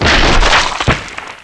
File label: SPLAT